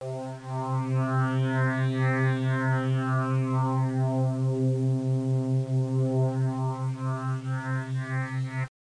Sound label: PAD